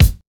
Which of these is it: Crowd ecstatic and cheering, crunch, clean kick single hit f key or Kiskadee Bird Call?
clean kick single hit f key